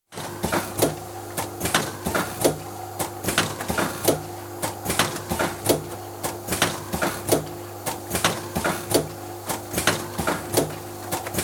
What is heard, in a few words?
Small Farm Diary Unit, interior, plastic milk sachets filled & heat sealed (Ends abruptly); Farm Sound Effects; BBC